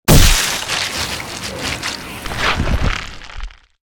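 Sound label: archimedeon death, monster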